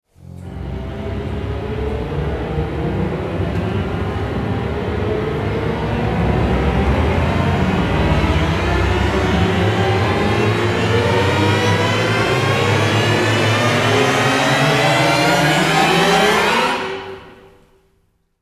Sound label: rise